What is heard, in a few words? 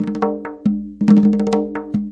arabic perc, Arabic Percussion Kit